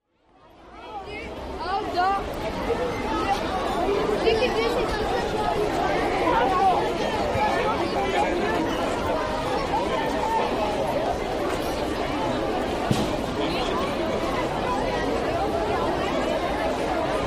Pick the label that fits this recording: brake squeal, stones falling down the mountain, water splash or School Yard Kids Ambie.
School Yard Kids Ambie